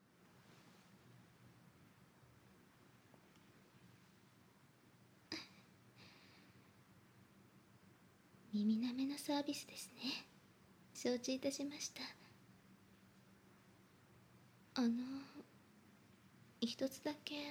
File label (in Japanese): 耳舐めサービス; 極上の癒し～夢見月の湯宿一泊二日専属仲居付きスイートプラン; 夢見月の湯宿本館